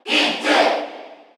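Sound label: Cheer Korean, crowd; Pit; SSBU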